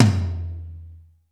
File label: ROOM T, ROOM KITS; Crypton; DrumBase5000